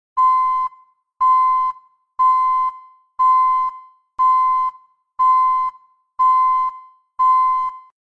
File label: Truck In Reverse - Beeping; صدای دنده عقب ماشین